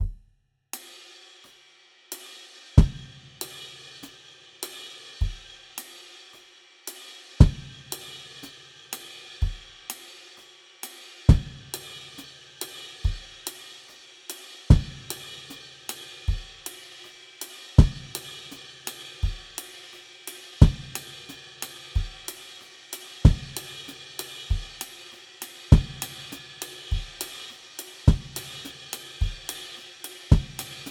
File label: Shuffle feel straight